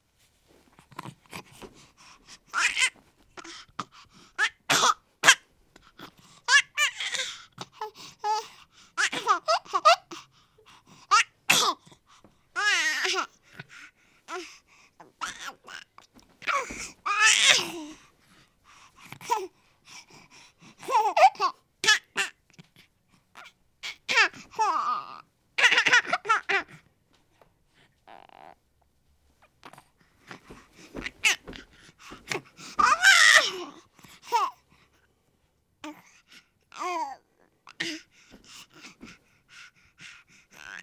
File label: Baby Noises